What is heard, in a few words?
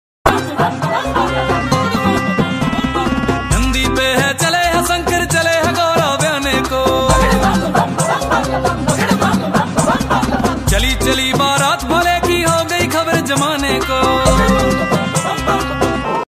bhakti ringtone music ringtone; mahadev ringtone; bholenath ringtone